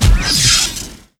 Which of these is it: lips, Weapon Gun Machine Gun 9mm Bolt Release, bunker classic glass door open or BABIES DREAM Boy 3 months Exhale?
bunker classic glass door open